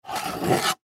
Film Slate Pick Up, Film Slate Pick Up Clapper Clap Board; Foley; Blastwave